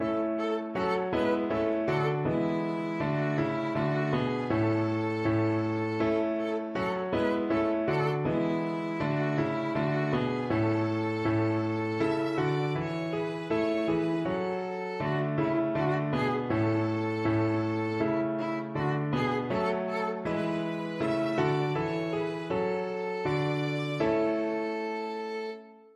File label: violin